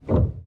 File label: piano release; samples-piano